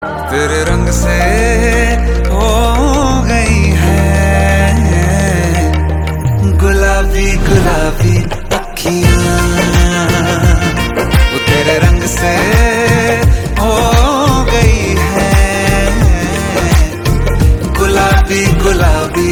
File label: Ringtone